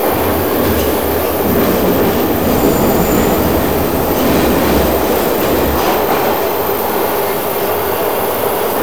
loop, trains

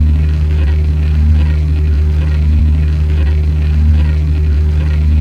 top, pong; CosmicRage

